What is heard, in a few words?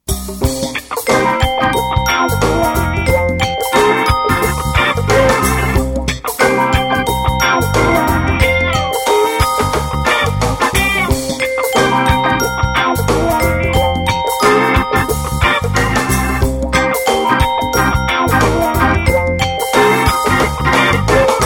Ring Funky; Asus P526